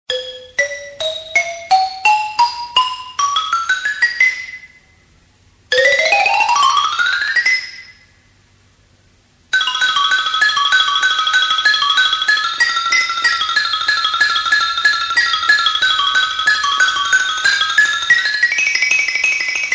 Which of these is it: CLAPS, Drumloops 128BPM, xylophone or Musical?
xylophone